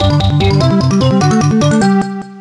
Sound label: Jingle Level Complete; Rayman Raving Rabbids; GBA